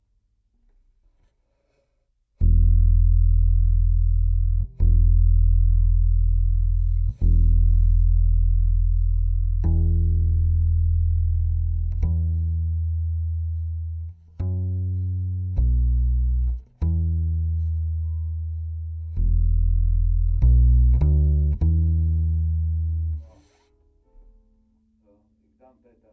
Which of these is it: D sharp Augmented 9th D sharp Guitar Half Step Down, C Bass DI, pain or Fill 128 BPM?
C Bass DI